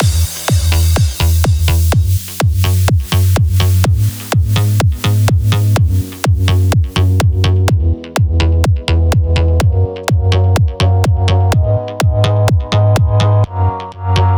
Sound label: Beat By Beat Lite Mix B Major 125bpm; Jinglepaket Radio Geretsried; Opener; Lite Mix 60 Seconds